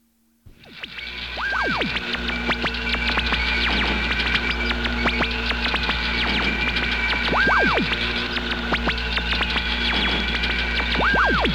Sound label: Garbled Communicator Static; Star Trek Original T. V. Series Sound Effects